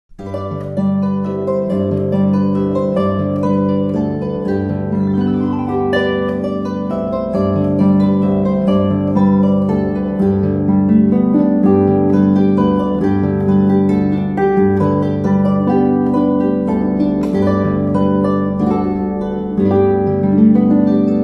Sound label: come all ye faithful shepherds, christmas carols on the harp